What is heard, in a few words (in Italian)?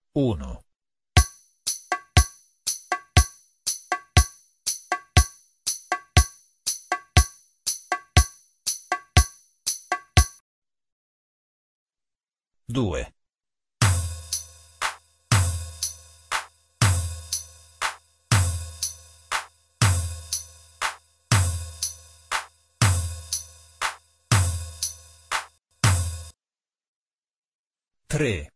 4 ritmi diversi; esercizio; Sonora vol A